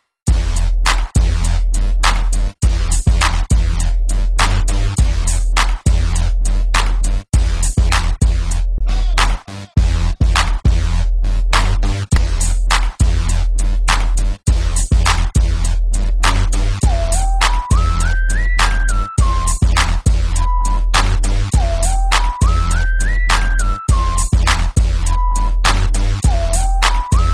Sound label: Bass Low